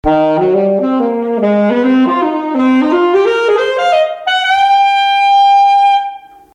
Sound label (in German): Saxophon